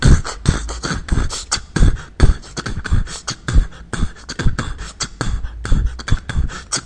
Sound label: beatboxing bassonly, 140 bpm